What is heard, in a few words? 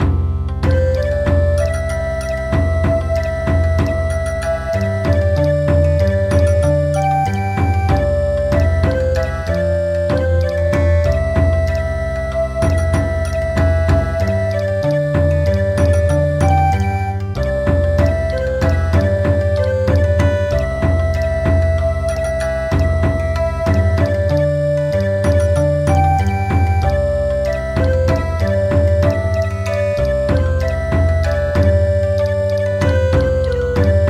Bon Odori